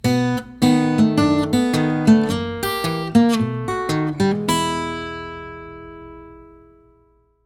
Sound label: II-V-I Turnaround